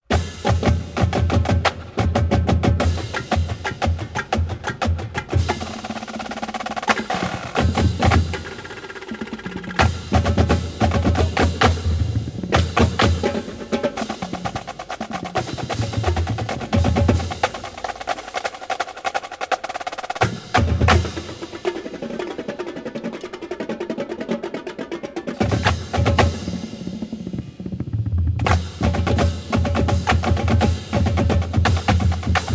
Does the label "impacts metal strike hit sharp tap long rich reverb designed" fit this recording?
No